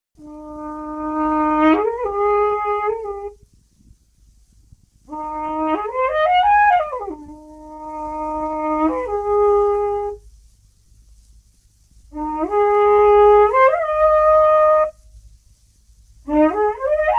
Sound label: Midwinter Horn Solo; Unknown Dutch Player